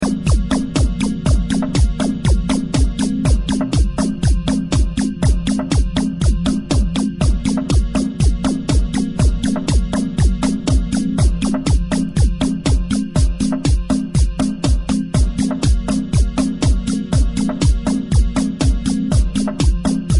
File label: bass synthesize house